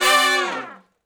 Med Length Falloff (D), Horncraft for R&B; Crimson Blue and Fabulous Horncraft 4 RnB; Sections

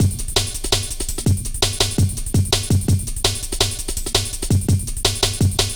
Drum Loops; Jungle; Zero-G Jungle Warfare; Hot Pantz 166.5

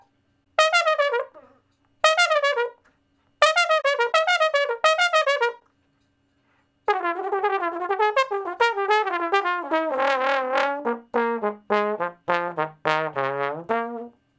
Bone Slo Blus (Bb V, IV, I, IV, I); Crimson Blue and Fabulous Horncraft 4 RnB

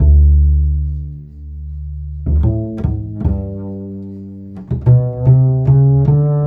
Acoustic Bass

